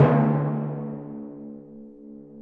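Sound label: Timpani Hit Low; Digital Sound Factory Virtuoso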